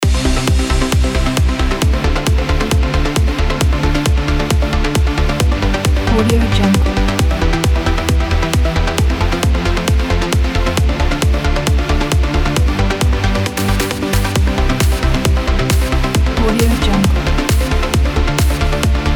trance loop

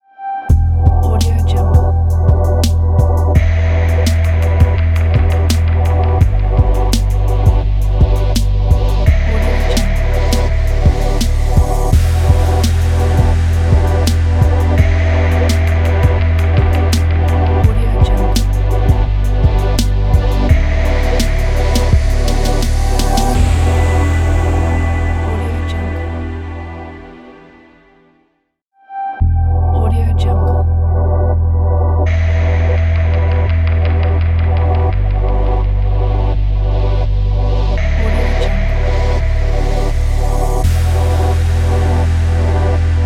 fashion luxury relax logo

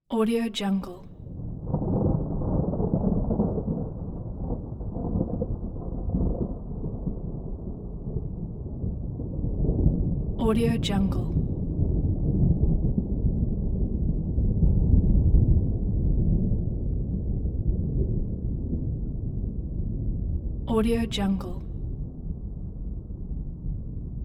thunder rumble; capeaudio

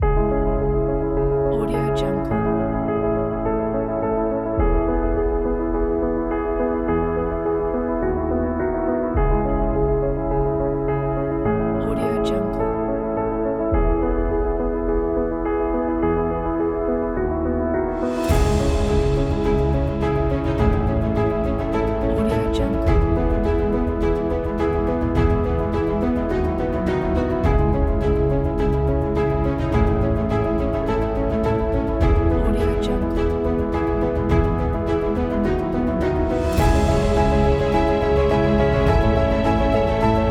cinematic emotional

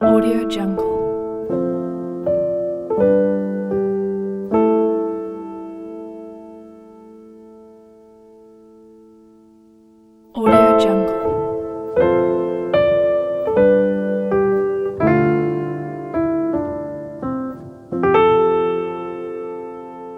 emotional piano and strings intro

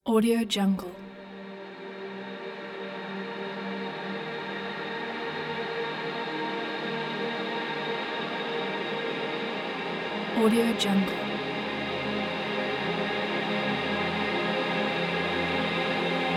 soundscape atmosphere ambient

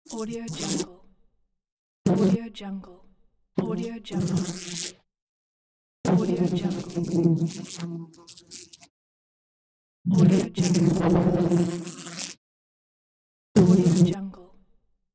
bottle fly on window; reazonate

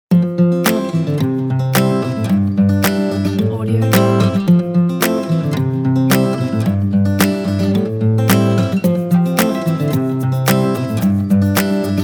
acoustic inspiration pack

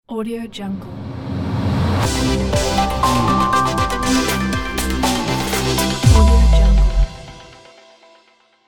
future logo

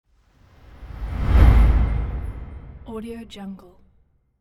transition

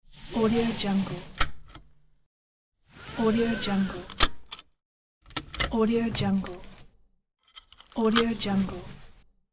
seat belt

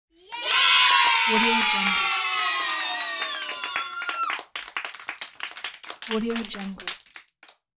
children cheering kids group yay

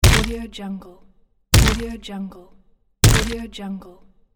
battle hammer hits

